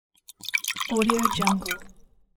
pouring a drink; teasound

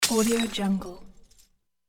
glass small break; hollywood edge

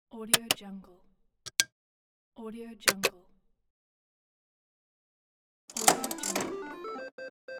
slot machine button

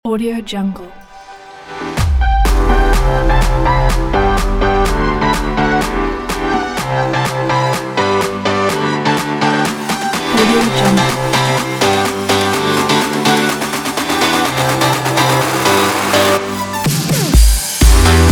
upbeat energetic summer pop

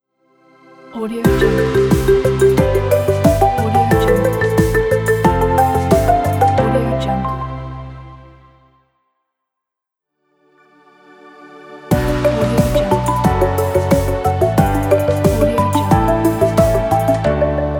big intro logo pack

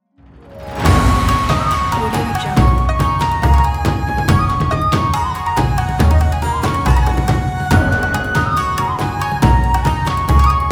chinese celebration

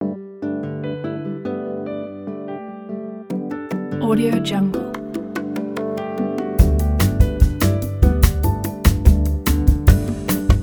short bossa for elevator

